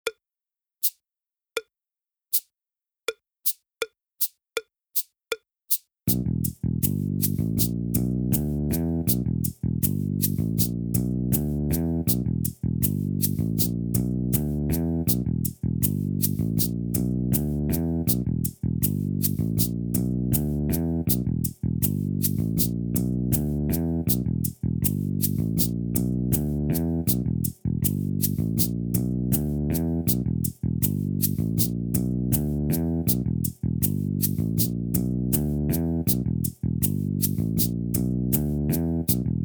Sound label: Bass Loop